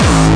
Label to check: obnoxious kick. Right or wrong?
Right